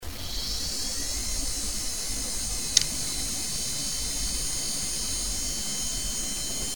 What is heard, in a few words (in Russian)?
Zvuki izdavaemye polnostyu ispravnym vinchesterom Samsung SV series